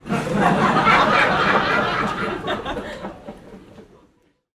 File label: human audience laughter comedy club x200 people